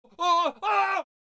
Male short screaming

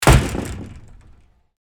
Screen door slam shut; household

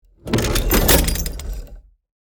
Heavy mechanical movement

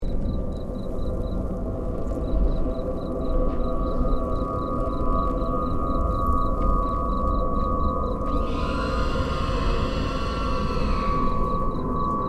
Ghost town ambience tension loop

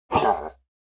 Monster vocalization

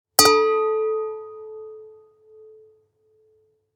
Singing glass impact single hit

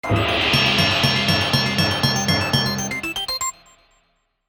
Slot machine casino bonus round intro

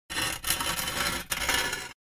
Metal object scrapes on concrete

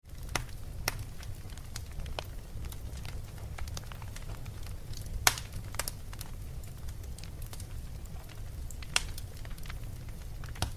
Burning fireplace and crackling fire

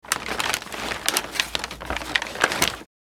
Christmas toys wrapping paper short

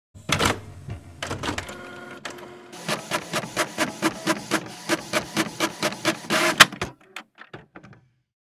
Epson ET printer printing multiple pages

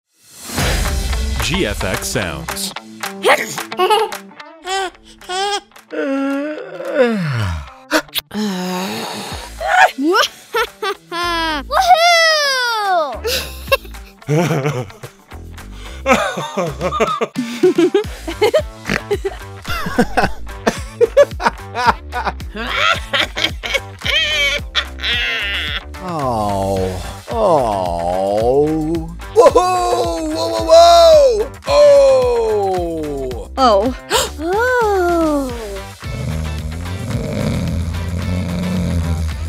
human voices; human voices sfx pack

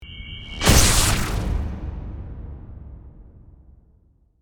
Electricity whoosh transition; cinematic

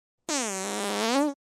Fart air noise

